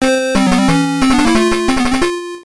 Death; Tails' Sky Patrol; Game Gear; Master System

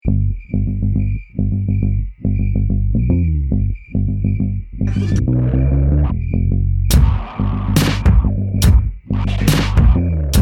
round ten sec count

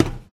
footstep metal low walk; ProSoundCollection v1 3 MONO Footsteps

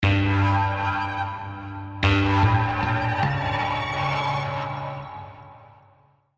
Jingle Boss Fight Intro Jingle

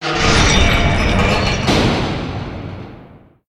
Lair Gate Close; silent hill homecoming 2008 gamerip pc ps3 x360

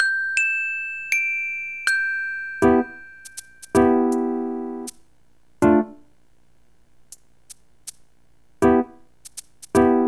6 PM (Rain); Animal Crossing Let's Go to the City

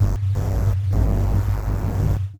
effect pulse; lucius

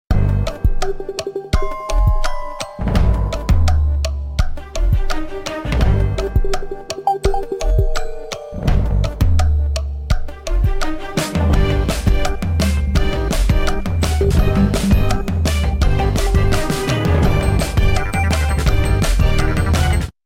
In Game Music 20 Second Countdown; Kahoot

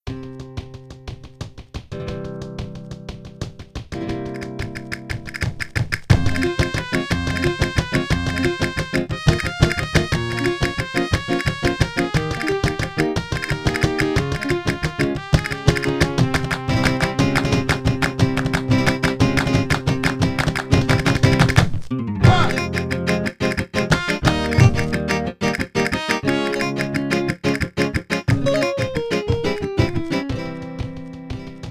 Show Time - Flamenco; Mario Artist Talent Studio

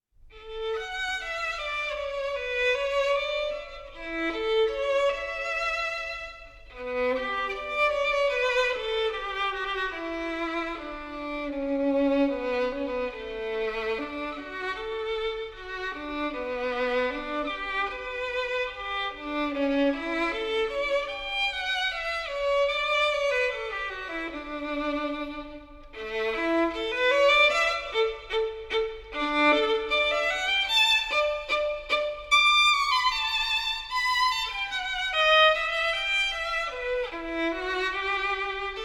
Violin; Dream Drama Story 13; ヘンリエッタのバイオリン; henrietta No Violin

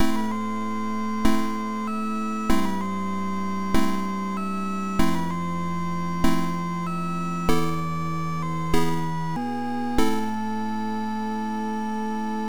Death; Hillsfar; PC-9801

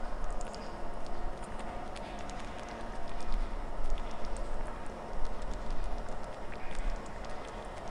Wet Cave; hidden deep windows gamerip 2022

